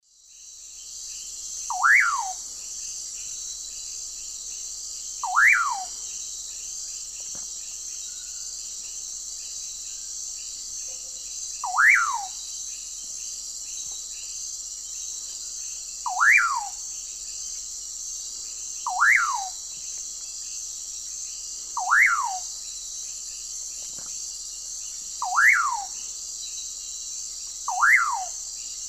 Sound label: Nyctidromus albicollis, song; S. America; Eastern Lowlands